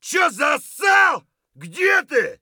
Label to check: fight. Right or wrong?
Right